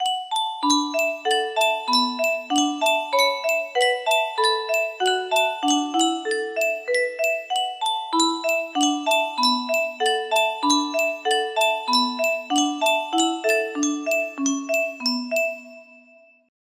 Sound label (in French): boite a musique